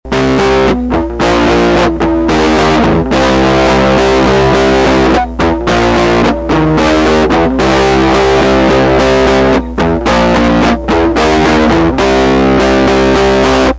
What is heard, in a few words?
Harmonic Jerkulator, guitar